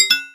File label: cowbell, chatping